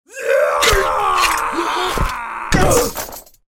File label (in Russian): krik udar padenie